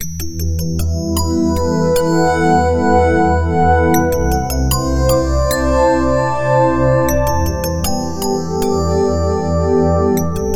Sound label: Ting Voice